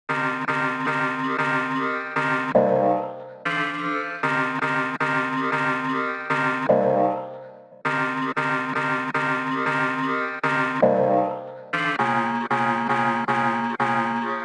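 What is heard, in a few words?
116 synth chord stabs digital rave C minor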